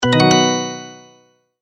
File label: multimedia tone advance